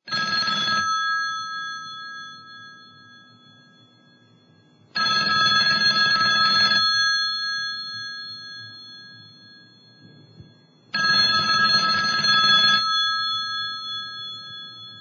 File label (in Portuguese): vinhetas Toque telefone antigo